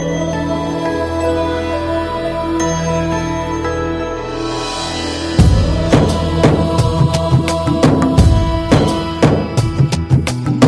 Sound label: Damarukam